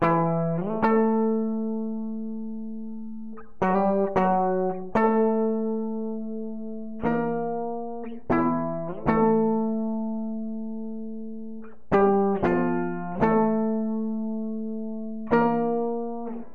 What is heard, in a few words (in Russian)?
zatsiklennye melodii dlia gitary loop